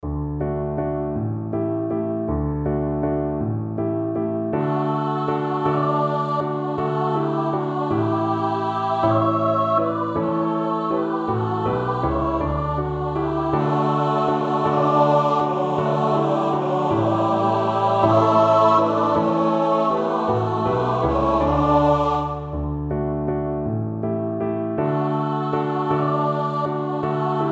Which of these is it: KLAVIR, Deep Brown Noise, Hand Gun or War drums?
KLAVIR